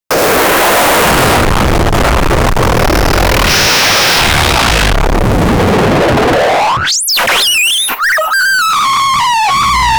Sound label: graping refrigirator, appliances